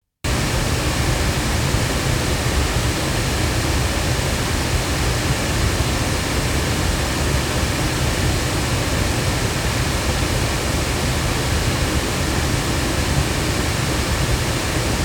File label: loudspeakers; R2; GoldenAge